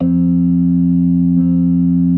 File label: B3 F 3; Best Service; Hyper Dance